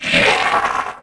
Dark Elf Hurt; SFXEnemies; DunDef

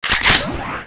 Reload Finished; Player; Huntress; Dungeon Defender; DunDef

